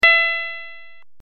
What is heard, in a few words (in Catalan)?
Mi sintetic